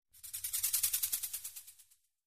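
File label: Animal Stinger Snake; stingers & whooshes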